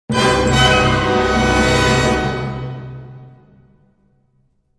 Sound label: battle suspense